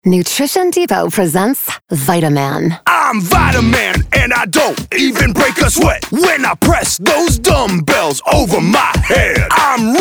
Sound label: Jingle